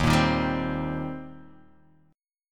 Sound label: Eb 6th Eb down; Eb6 strummed; JGuitar